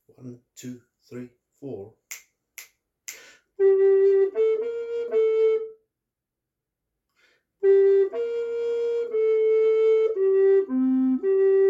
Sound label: Bass Part H, recorder